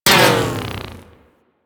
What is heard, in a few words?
Mag Pull Grapple On; Warframe